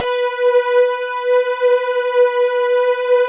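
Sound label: SATURN B5, bass; Drums In Your FACE!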